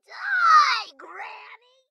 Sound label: goon buggy; Blind Drive